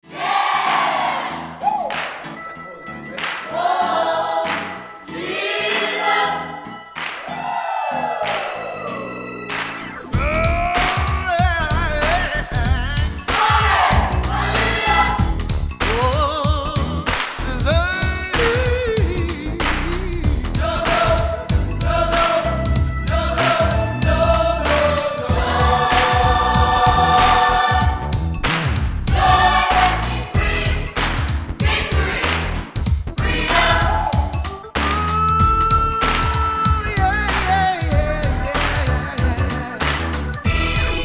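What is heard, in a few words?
GOSPEL CHOIR; Spectrasonics; Symphony of Voices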